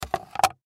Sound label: Film Slate Set Down, Foley Film Slate Set Down Clapper Clap Board; Set Downs; Objects; Blastwave